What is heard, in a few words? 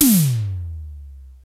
E tom, Roland JD-990; Drum Samples